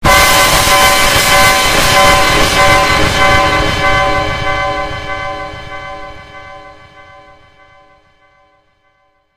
jumpscare sound

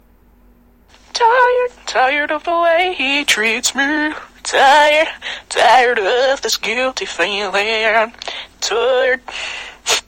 singing tired

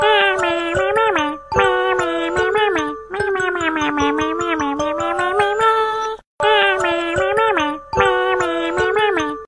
alarm meaw

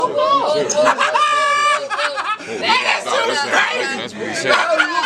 grown man laugh

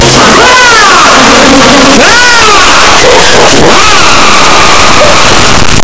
scream loud; the floater

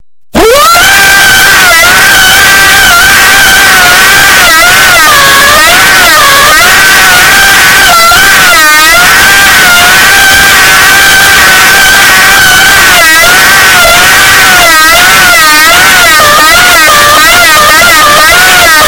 screaming earape

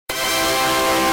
tada earrape; windows

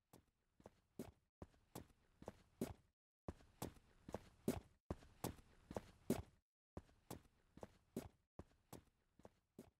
footsteps on hard floor

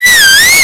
crazy whistle

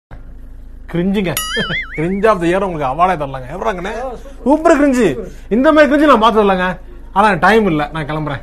cringe ngaaa

